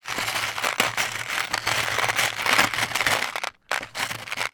industrial small screws movement by hand in plastic container